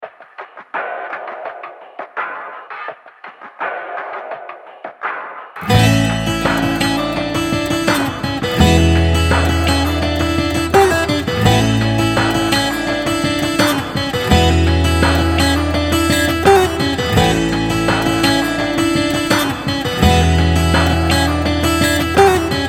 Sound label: Acoustic